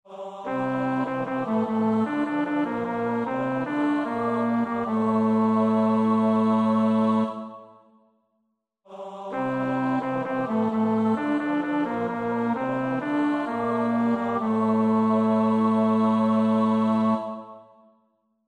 bas, koorpartij oefening; Schütz (Heinrich); Matthäus Passion SWV 479

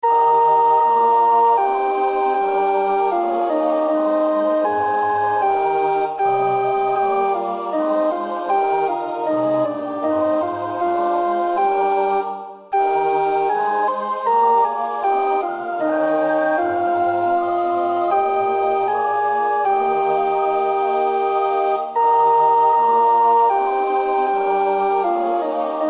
alt, koorpartij oefening; Bach (Johann Sebastiaan); BWV 245 Johannes Passion; Ach Herr, laß dein lieb'

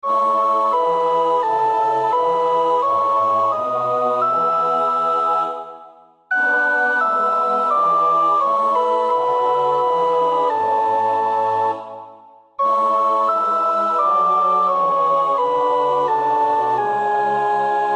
sopraan, koorpartij; Bach (Johann Sebastiaan); BWV 245 Johannes Passion

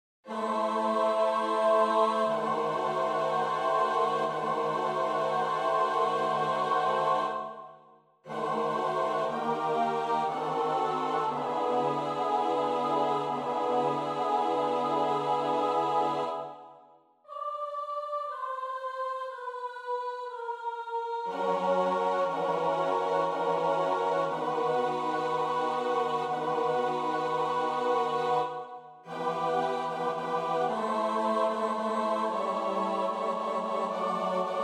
S-A-T-B, koorpartij oefening; Vivaldi (Antonio); Gloria RV589

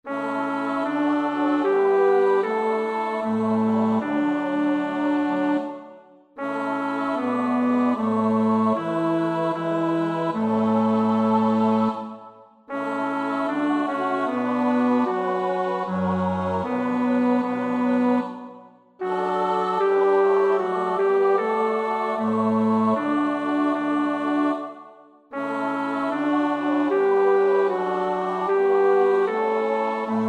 bas, koorpartij oefening; Bach (Johann Sebastiaan); BWV 163; Führ auch mein Herz und Sinn

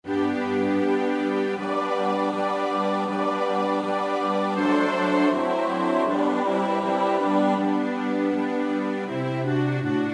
S-A-T-B, koorpartij oefening; Haydn (Joseph); Mis in G (Rorate coeli desuper)

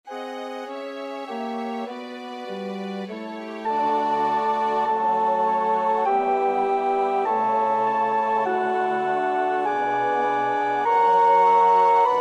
sopraan, koorpartij oefening; Reger (Max); Vom Himmel hoch, da komm ich her

